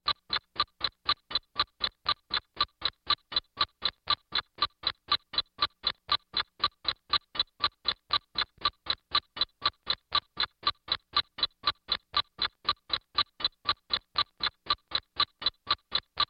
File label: music tick, 音樂循環; Scratch1000圖庫